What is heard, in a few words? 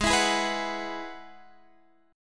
G sharp Minor 7th Sharp 5th G sharp, Guitar Standard; G#m7#5 strummed; x,11,14,13,12,14; JGuitar